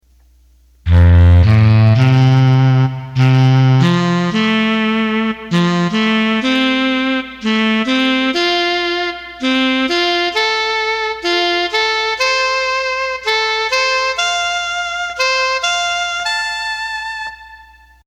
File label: Saxophone